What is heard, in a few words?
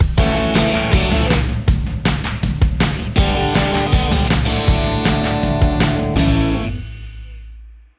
moving forward